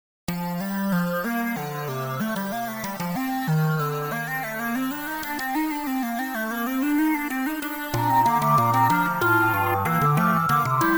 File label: b flat fugue synth; wtc1